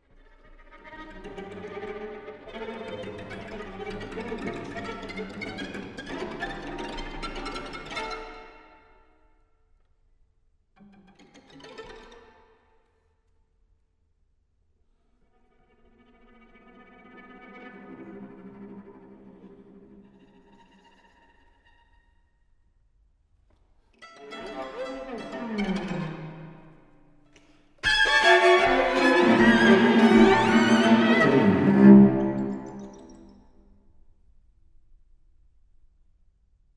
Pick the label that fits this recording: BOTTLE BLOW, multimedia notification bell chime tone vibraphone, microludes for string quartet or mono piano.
microludes for string quartet